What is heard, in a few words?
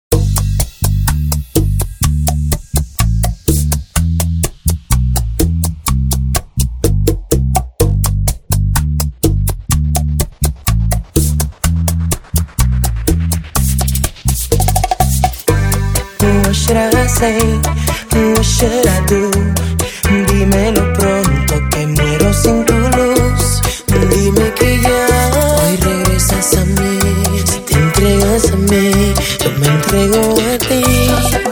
BACHATA INTRO BPM 125